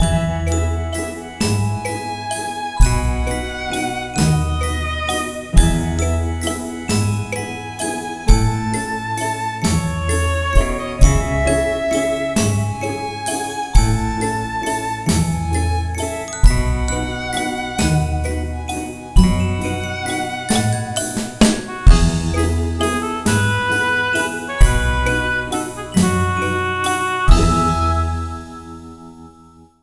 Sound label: Whimsical Waltz